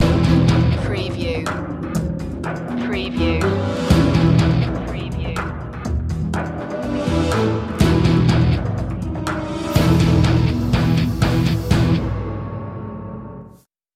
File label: rock mix